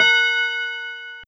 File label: Bell A#5